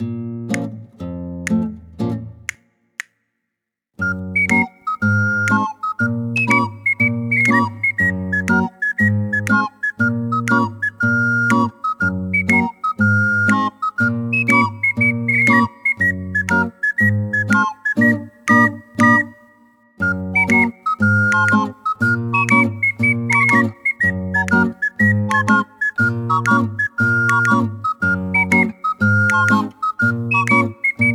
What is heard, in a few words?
Sing